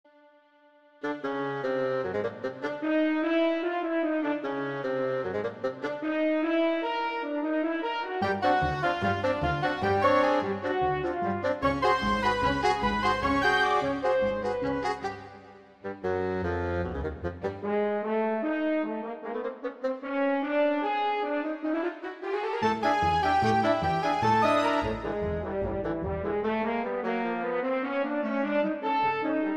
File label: orchestral; ALON HIGH SCHOOL CHOIR RAMAT-HASHARON ISRAEL